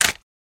Bone Crab Shell Break

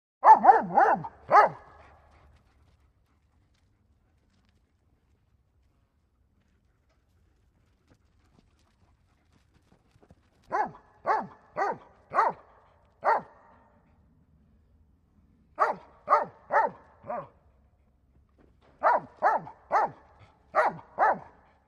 Dog Mutt Barks Move, mix

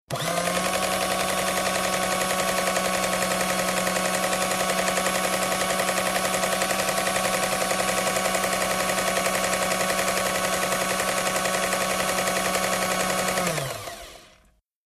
Food Mixer Strt Run, appliances

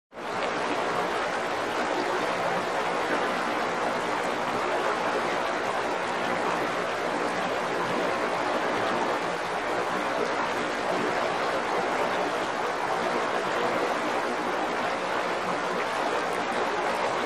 River Rushing Rapids

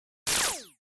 Laser Gun Blast Fast; laser fx

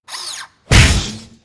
Cartoony Impacts, servos

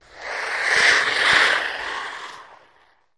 Ice Skating Swerves

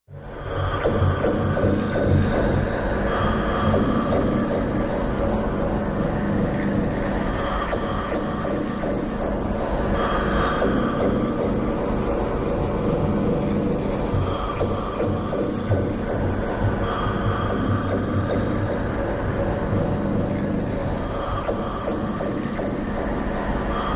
In Air Tight Amb Alien, eerie ambience

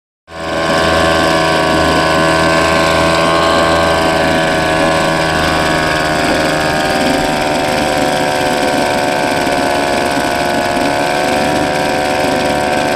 Outboard Motor; Run; Outboard Motor Running.

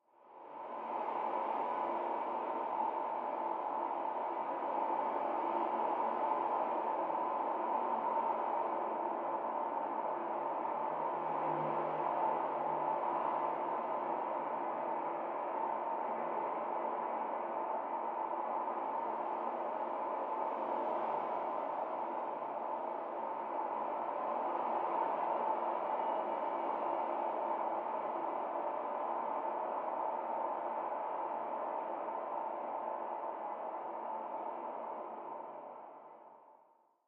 Traffic Medium City

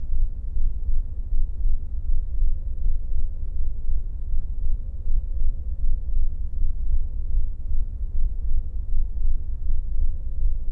Space Ship Ambient; spaceship fx

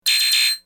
Computer Warning Bee, beeps & tones

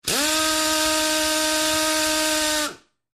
Air Drill Straining; air tool

